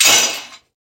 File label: Glass Break; SoundsCrate